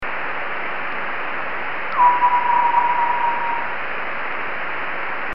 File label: geminid echo